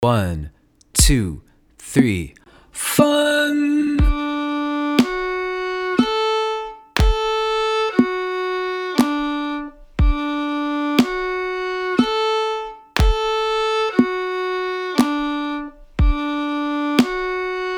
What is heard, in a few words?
D triad slur across strings